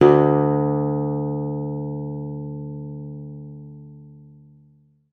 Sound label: GUITAR D2; Xsample VOL-2